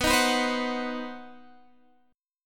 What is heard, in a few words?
C Minor Major 7th Flat 5th B, chord